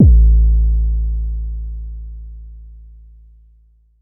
808 A; Abletunes

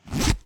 Zipper

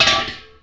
Klank

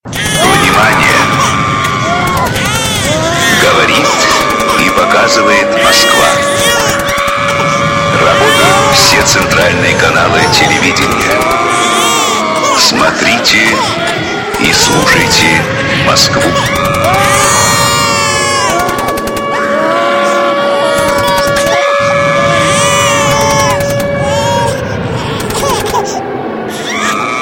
War sounds Russian

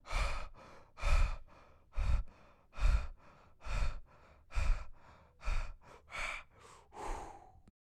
Heavy Breathing

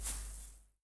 Grass Step Left

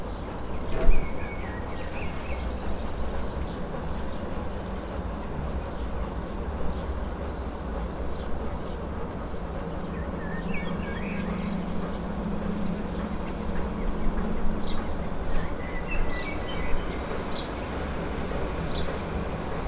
Early Morning

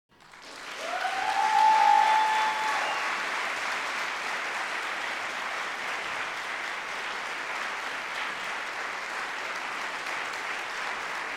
Applause

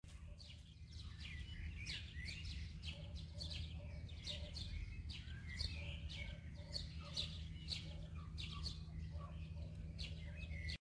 Varna Birds Singing Garden

